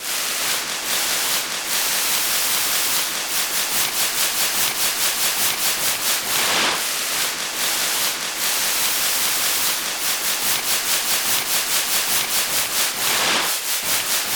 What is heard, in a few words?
sea shore